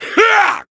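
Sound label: attack, Japanese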